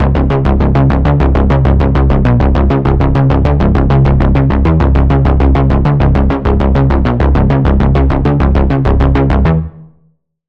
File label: bass; Death Grid; Routed Sound; Vital Expansion - Doom Carpenter Vol.1